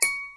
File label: knock